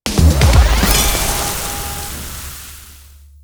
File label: bigwin start; netent